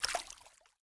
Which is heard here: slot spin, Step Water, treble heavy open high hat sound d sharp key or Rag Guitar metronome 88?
Step Water